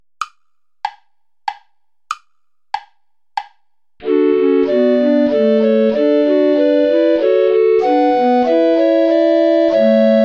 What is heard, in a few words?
play along without soprano recorder